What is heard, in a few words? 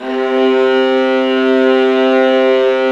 ARCO VIOL, Arco Violas